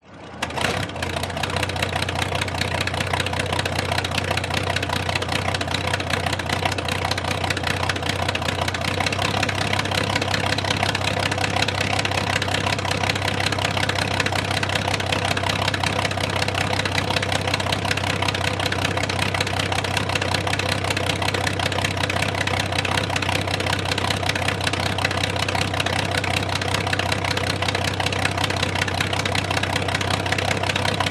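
warfare tank matilda mark 2 1939 engine idle exhaust perspective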